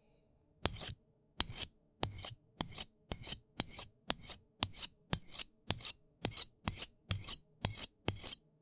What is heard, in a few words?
knife cutting a sands